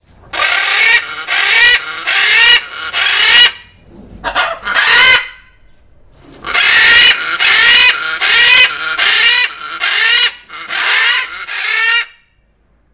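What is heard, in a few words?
Macaw, Stressed, Shouting, Close, Perspective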